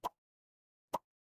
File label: cartoon pop